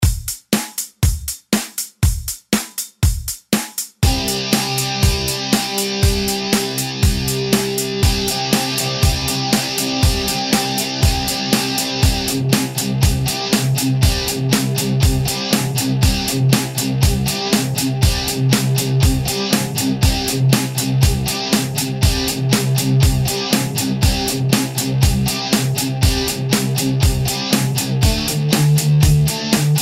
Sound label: jam loud